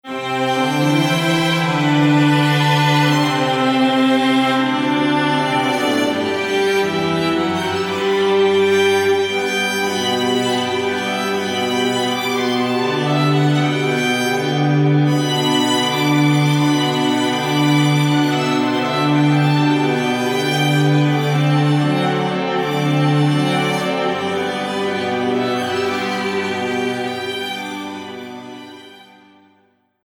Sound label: Lively Violins